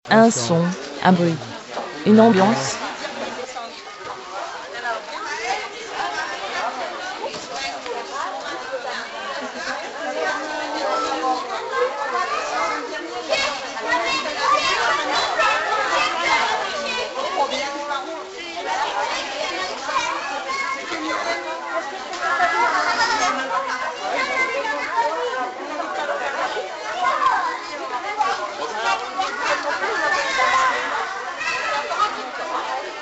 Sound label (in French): Un son un bruit une ambiance